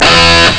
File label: sweet guitar; ST-XX Amiga Soundtracker Sample Packs